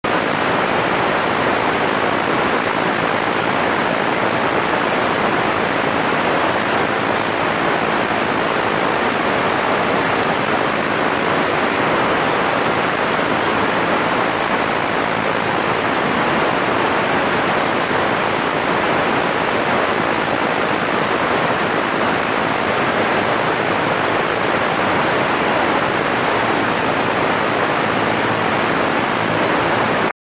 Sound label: Static Harsh Fm Type